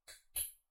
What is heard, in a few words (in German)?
Taschenlampe Klick